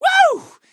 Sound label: woo